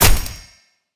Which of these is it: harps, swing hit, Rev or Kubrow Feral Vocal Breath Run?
swing hit